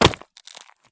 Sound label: landing gravel; Escape from Tarkov